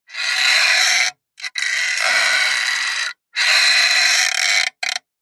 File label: metal stress creak thin tone; zapsplat